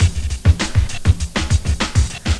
metro bgm; midi

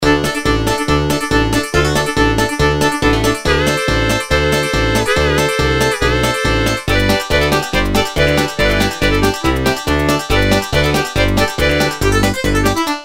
BlueGrass

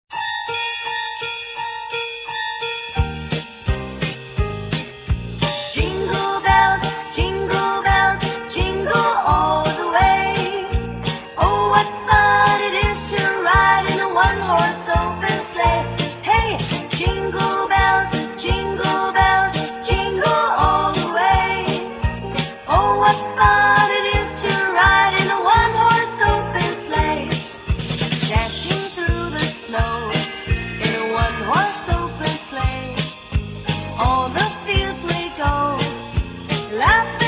jinglebells, midi; 铃儿响叮当

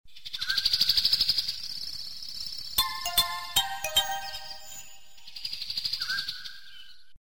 Good Morning, midi

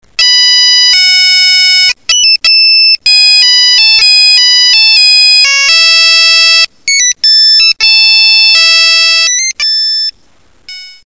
theme siemens, Polyfonisk musik; midi

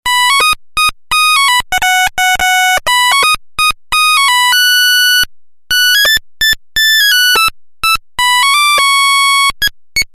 siemens, Polyfonisk musik; midi

